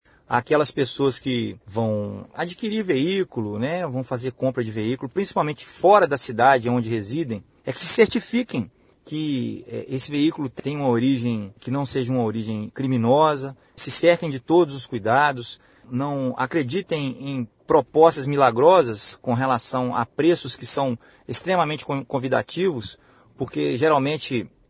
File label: Rhodes